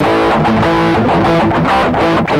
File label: Guitar Riff Am